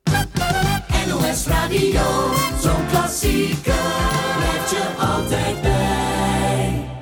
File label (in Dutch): omroep jingles